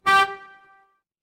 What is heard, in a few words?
mechanical horn